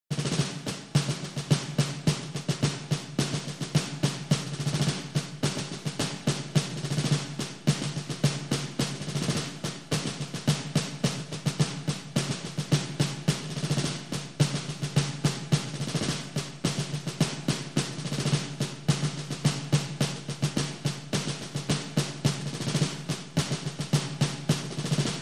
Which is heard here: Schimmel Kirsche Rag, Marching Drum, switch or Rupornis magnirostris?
Marching Drum